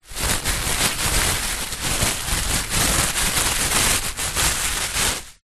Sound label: leisure juggling bean bag small movement in hand squash; zapsplat